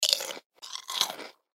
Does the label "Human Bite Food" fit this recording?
Yes